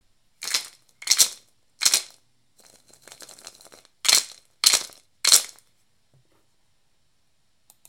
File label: Zebra rattle; Hand-Crafted Instruments from Africa, South America & South Asia; rattles